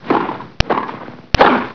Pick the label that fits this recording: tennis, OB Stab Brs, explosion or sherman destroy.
tennis